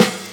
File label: snare; Breaks N Beats Sound Kit